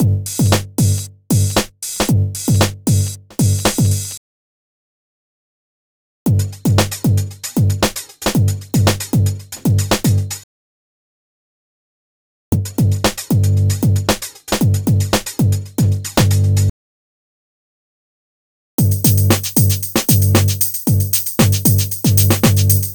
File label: Breaks Drums 115 BPM; ударных сэмпла в стиле брейкбит